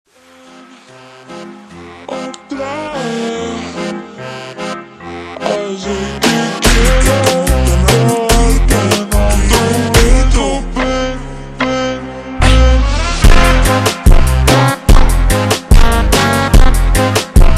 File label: super slowed, funk